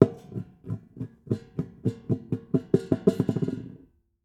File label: set, figures set on table